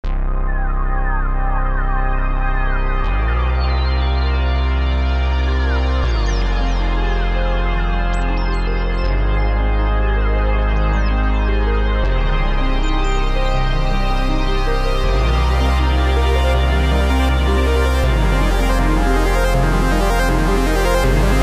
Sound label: Modular; Arturia